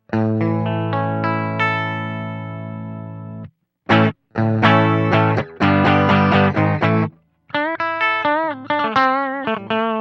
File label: dimarzio clean; greco